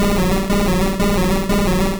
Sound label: Psybeam Loop